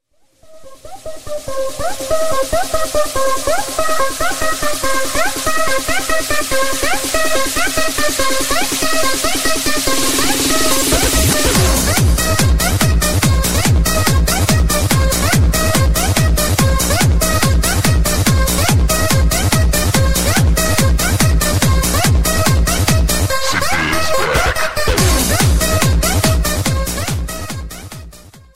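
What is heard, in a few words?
cool techno ringtone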